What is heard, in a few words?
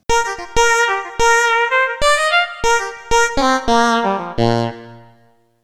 Stereo Filters